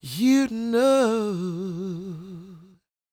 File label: croon, Vocal Planet Blues Soul; Spectrasonics